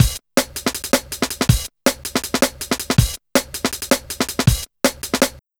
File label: 161, breaks, Loops; M8